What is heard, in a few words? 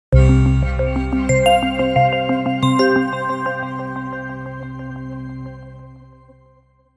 Elegant Logo